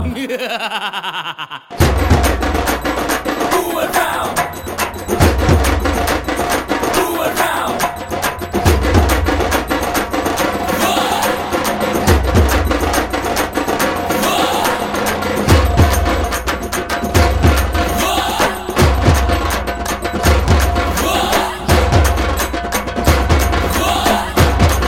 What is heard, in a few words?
Ringtone, Tamil Ringtones; 2009 Tamil Hit Ringtones